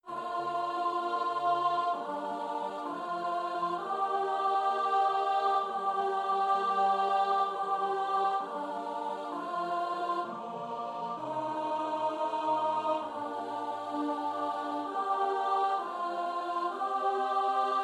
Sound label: Psalm alto